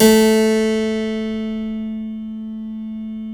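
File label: A2 clavinet left, Keyboards Acoustic; USB Soundscan vol.17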